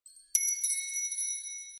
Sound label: wind chime sms; ringtone